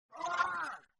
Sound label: man scream